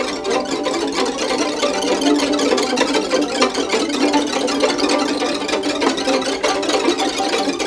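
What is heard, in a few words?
VLN COL LEGNO, Violin; Q Up Arts; Denny Jaeger Master Violin Library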